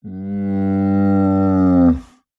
Animal Mammal Herbivore Camel Groan; animals; AdobeCC

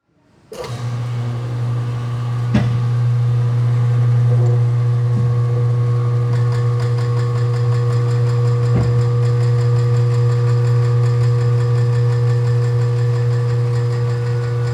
Household Door Opening Hanger Door

